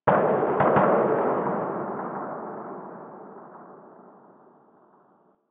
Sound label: Distant Gunfire; Warframe; Ambience; PVP